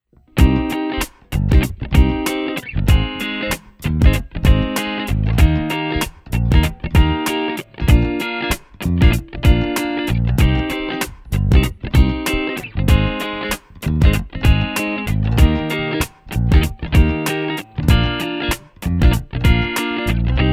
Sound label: gt clean cable funk